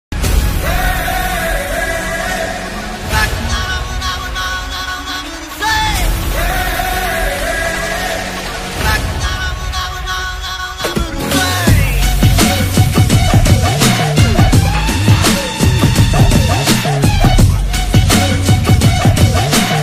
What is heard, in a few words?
disco bgm; coolie